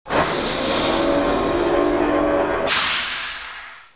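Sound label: door close; Babylon 5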